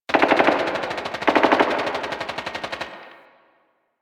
Distant Gunfire; Warframe; Ambience; Nineteen Ninety Nine

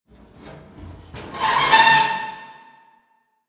Destruction Resonant Metal; Ambience

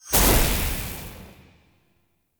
Energy Spike Border Start; Powers

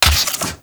Fire Tail, Weapons; Lato Prime

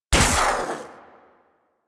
Amp Barrel Shot, Weapons; Warframe; Operators; Amplifiers; Amp Set 2

